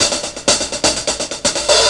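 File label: short hi-hat loop; Best Service; Hyper Dance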